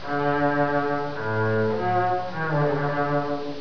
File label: bass